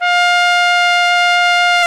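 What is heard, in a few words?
BRS, Brass Trumpet f; Roland L-CDX-03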